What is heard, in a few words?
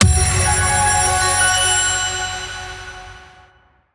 scatter win